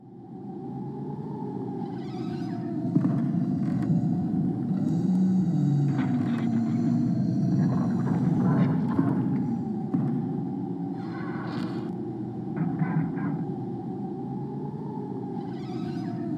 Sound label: Attic Ambiance; I Spy Spooky Mansion Deluxe